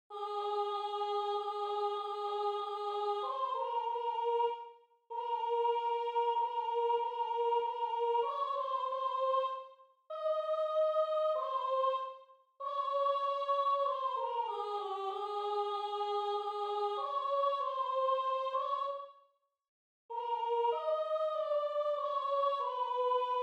Piu non si trovano sopraan

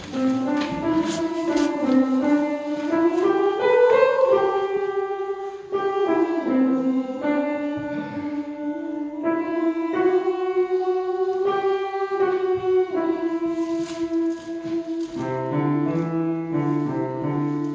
Sopraan; The Sally Garden

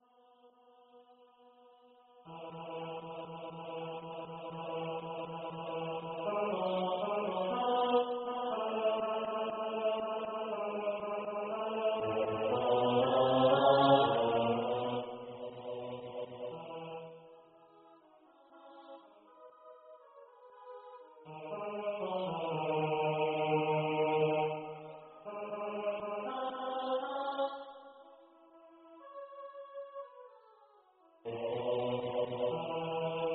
Bas; Les Fleurs Les Arbres

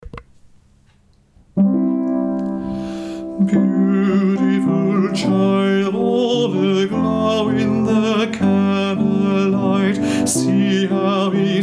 Born is the light tenor

